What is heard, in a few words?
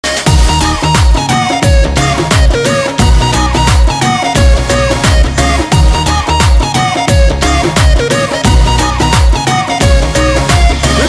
Instrumental Ringtone, Bollywood